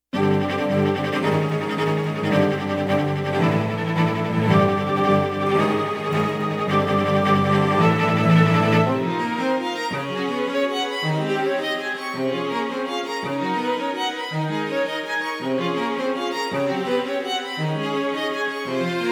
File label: String Theory